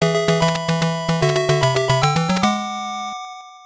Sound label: Brass of Victory; densetsu no ogre battle gaiden zenobia no ouji neo geo pocket color